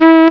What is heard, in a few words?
SAX HI; Blasinstrumente